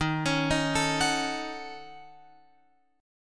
D sharp Minor 7th Flat 5th D sharp down Guitar Open F; arpeggiated